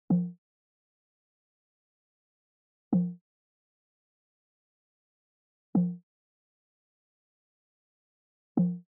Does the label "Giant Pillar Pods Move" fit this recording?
No